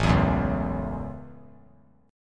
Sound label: A 6th; strummed